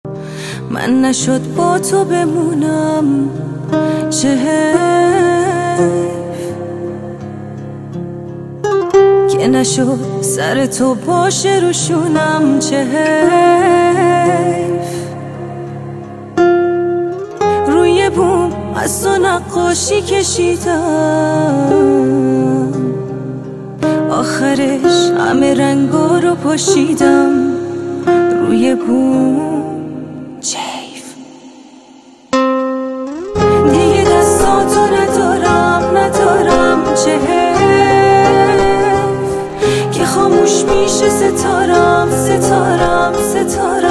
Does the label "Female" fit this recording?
Yes